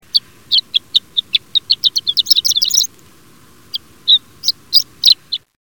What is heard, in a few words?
horned lark